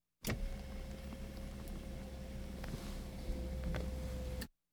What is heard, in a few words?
Automobiles various